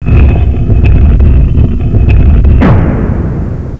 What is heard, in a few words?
stonedoor